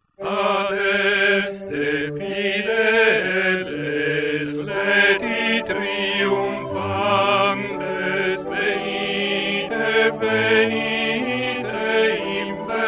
Adeste fideles - Tenori+Base; Natum de Maria Virgine

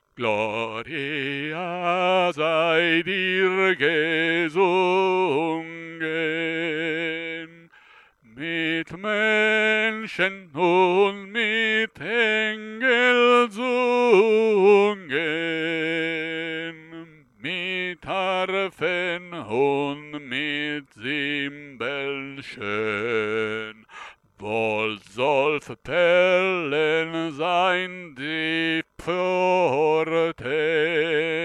Gloria sei dir gesungen - Contralti; BWV140-7

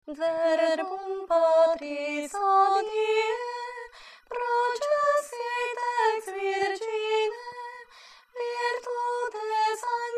Verbum Patris - Bassi e Contralti; Factum Est

